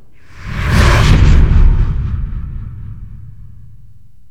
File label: flyby